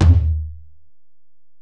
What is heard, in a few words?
Tom, Alesis DM-PRO